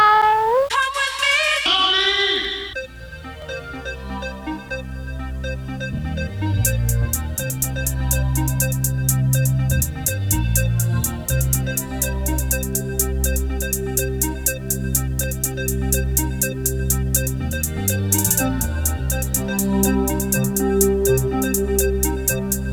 instrumental mix

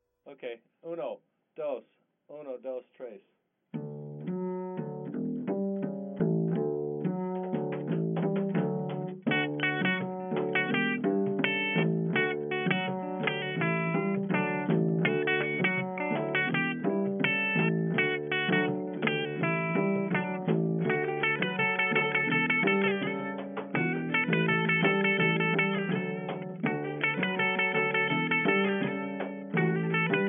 cumbia jazz fusion

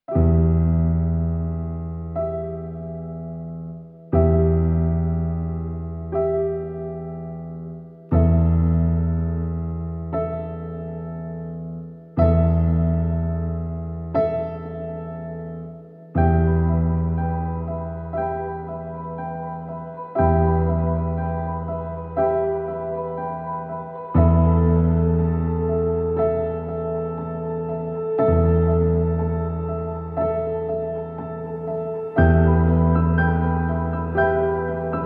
stem piano

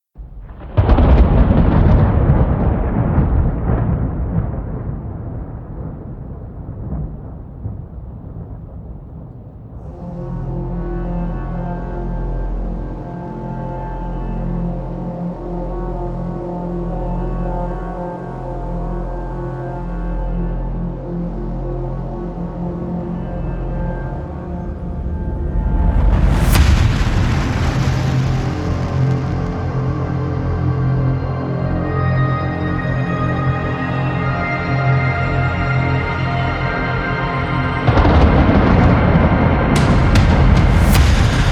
synthwave

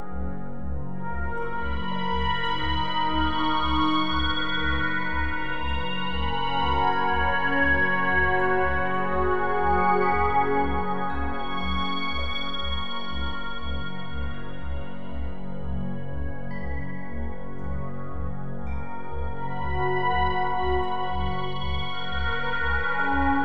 meditation music and delta waves for sleep

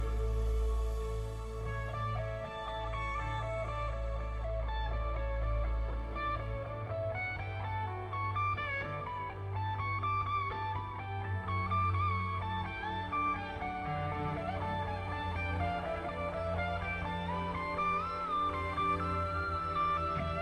guitar solo

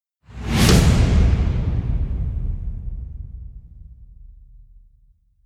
slams war with whoosh

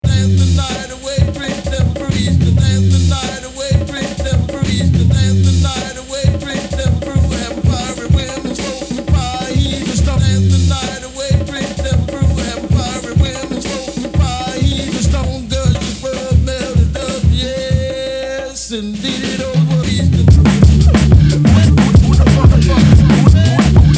deep breath deep bass

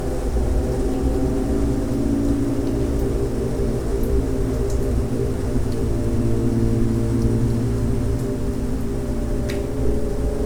om mantra full night meditation with rain sound

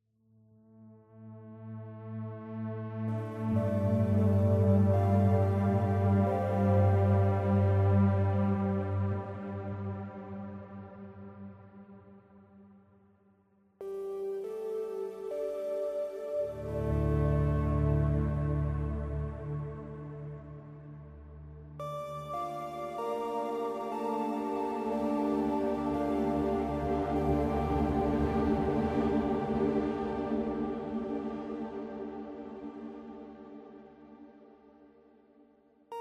ambient

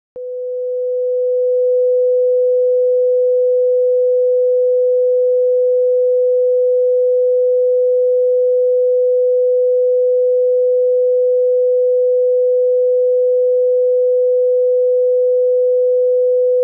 500 Hz sine wave; 10 db

